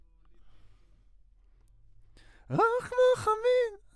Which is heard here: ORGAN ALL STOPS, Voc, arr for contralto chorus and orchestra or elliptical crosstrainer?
Voc